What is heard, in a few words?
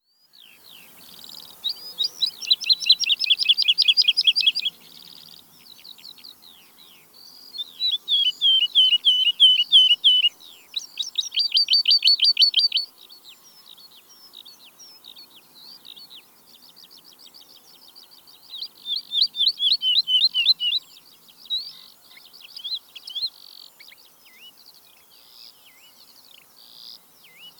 Woodlark, birdsong